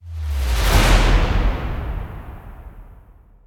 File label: big Win Ramp Up